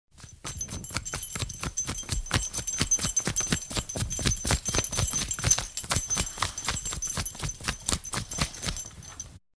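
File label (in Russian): sobaka cheshet sheu, dog scratching its neck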